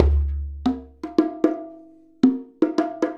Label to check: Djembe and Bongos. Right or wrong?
Right